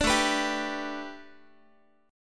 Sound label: A 9th Suspended 4th Guitar, chord; A9sus4; strummed; JGuitar